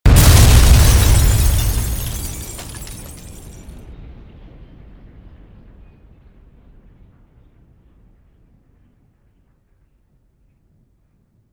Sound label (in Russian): mashina zagogaetsja stekla